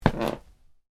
Footstep wood light, Shoes: Individual Footstep On Wood, Light; Footsteps; Human; Stuart Duffield